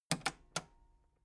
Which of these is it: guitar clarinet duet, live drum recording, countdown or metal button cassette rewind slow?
metal button cassette rewind slow